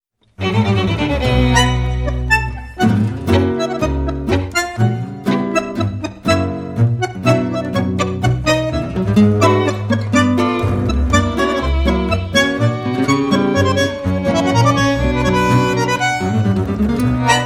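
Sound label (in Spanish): Catamarca, tango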